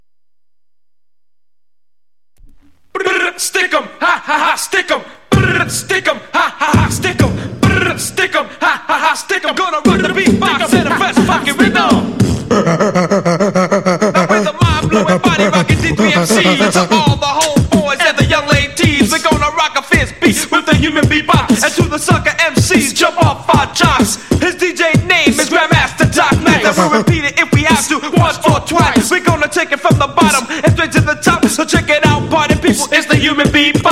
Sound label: disco human beatbox